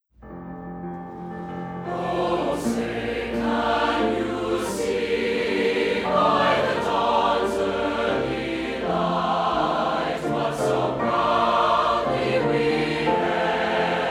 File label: Choir w Piano SSB, National Anthem; All-State Choir w/Piano Recording; MSHSAA MMEA